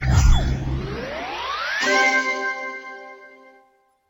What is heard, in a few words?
Level Completed